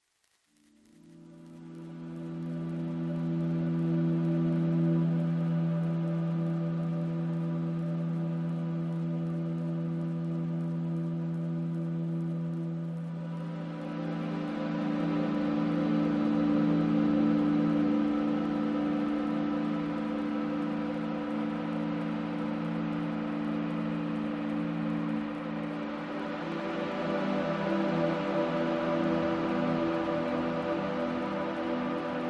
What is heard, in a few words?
Like Leaves On Water, Pads